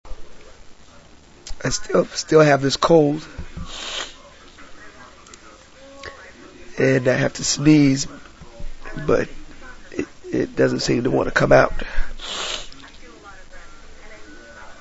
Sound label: cold sneezes, male